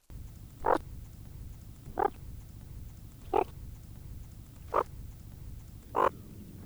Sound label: foraging call calls